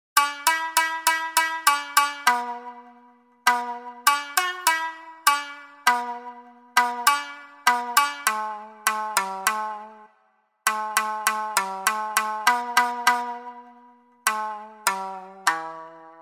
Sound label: Traditional Japanese Folk Shamisen; ShamiKoto; Syntheway